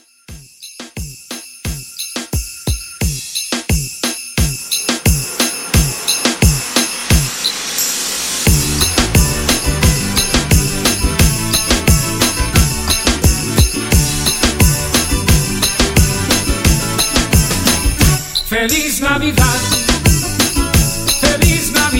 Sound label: Christmas Bells Moombah Re Drum 88 CLEAN